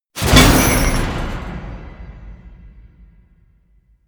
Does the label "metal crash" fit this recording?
Yes